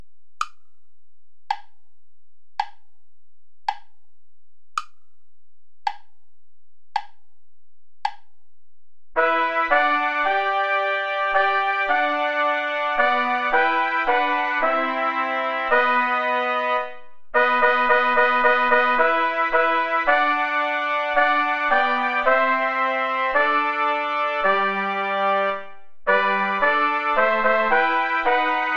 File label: play along without trumpet; schutz heinrich; aller augen warten auf dich herre